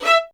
LDVLN1 ATT E, LOUD VIOLINS; Q Up Arts; Denny Jaeger Master Violin Library

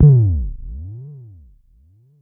Mod bd, Jomox Airbase99

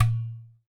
GHATAM, DRUMS OF IND

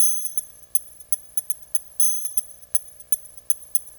Bass, Promotional Loops; ESSENTIAL SOUNDS III; Sonic Foundry (Sony Creative Software); Not Just Another Pretty Bass

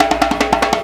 PRC HAND, Africa, Perc Loops; Roland LCDP14 Africa VOL-2

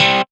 Synths Root C

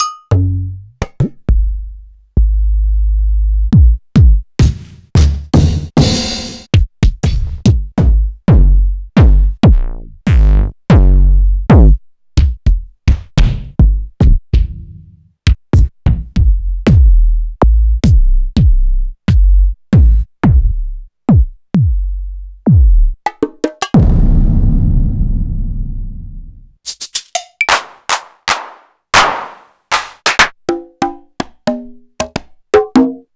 Korg Electribe EMX-10

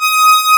V STR B4, PROPHET 5; Greytsounds VOL-1

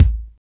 KICK, Tekno Industrial; East West

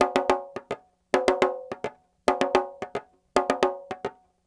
Petia, World Percussion

